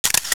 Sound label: Shutter